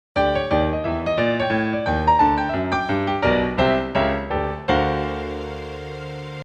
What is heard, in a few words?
Gospel Movement